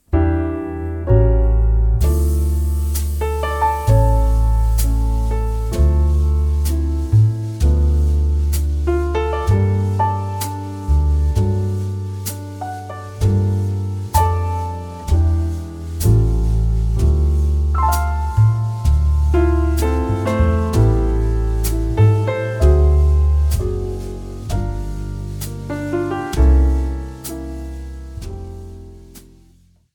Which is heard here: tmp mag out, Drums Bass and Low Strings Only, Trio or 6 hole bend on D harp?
Trio